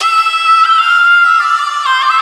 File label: Vocal Sample Pack Phrases Am; Slate Digital Omnivox Vocal Sample Pack